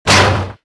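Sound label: run, footsteps; Star Wars Jedi Knight II Jedi Outcast; XBOX; mark1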